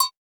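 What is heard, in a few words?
Hip-Hop Kit cowbell; Roland Juno-D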